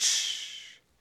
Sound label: Vocal Crash